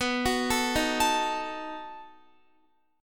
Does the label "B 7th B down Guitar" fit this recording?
Yes